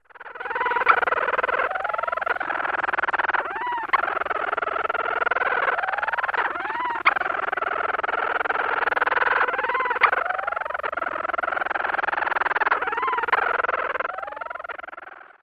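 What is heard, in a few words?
pair giving purr calls, Leach's storm-petrel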